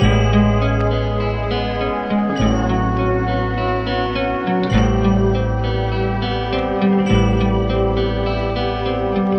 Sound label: horns, phonky loops